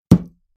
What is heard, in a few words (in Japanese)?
コースターにグラスを置く音